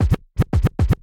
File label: Record Scratch; Hover Hands Founder